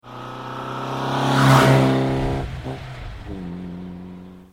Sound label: mg, engine